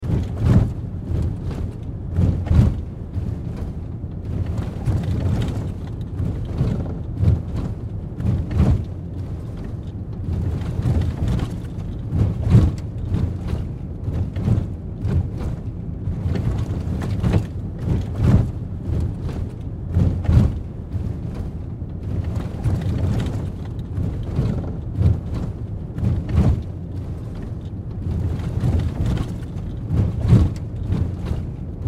Ford Explorer 2008 Drive Dirt Bumpy Off Road Loop Interior; Cars